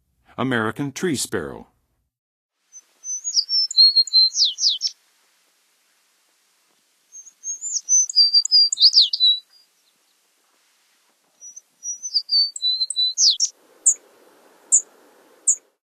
American Tree Sparrow, Songs of Birds of North America; Western USA; Western USA Songbirds